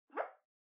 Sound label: OS Sound (Speaker-Notification)